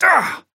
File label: pain small; Trapper